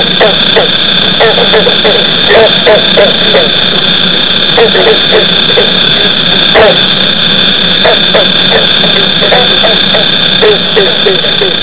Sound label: green frog